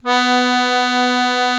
AKK VIOLIN H, AKKORDEON; Best Service; Alpine Volksmusik

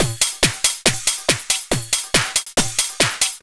Beats, Synchro Funk; Tekbase; Sonic Foundry (Sony Creative Software)

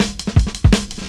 Persistent Cut, Drum Loops 165.5; Zero-G Jungle Warfare 1, 2 & 3

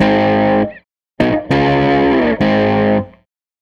Tele Guitar Loop

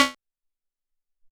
Sci Fi

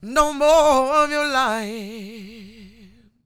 SING W, Vocal Planet Blues & Soul, BLUESY; ILIO

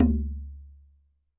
DARABUKA; WorldSounds

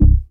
DM ORG1, Dance Mega Synth

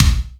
BD, Yamaha RY-10, Drums

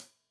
Hat Loose, Hi-Hat, Cymbals Stereo

